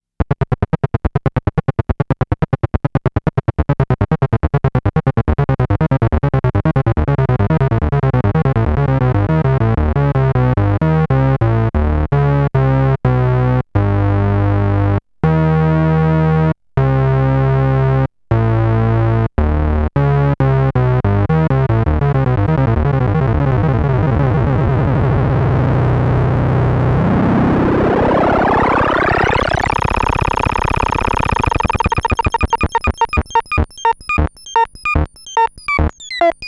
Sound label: Gate Time Tempo Range Glide Length; Urzwerg; Nanozwerg